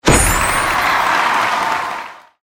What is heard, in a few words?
collect